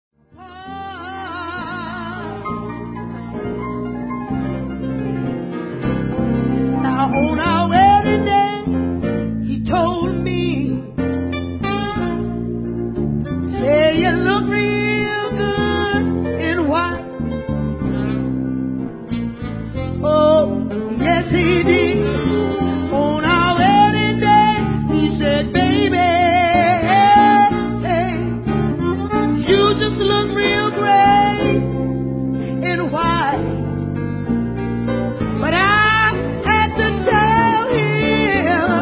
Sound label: whiskey blues